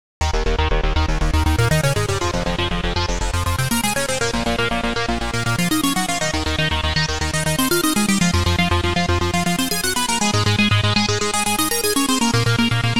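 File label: seq 16 magic arpeggio sequence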